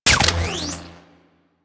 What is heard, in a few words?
Shotgun Mech; Crp Shotgun